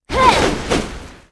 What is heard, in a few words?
attack; Sapi